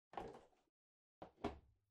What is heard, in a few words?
Electric Fan (Far-Put Down)